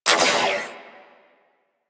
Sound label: Shot, Weapons; Warframe; Tenno; Miter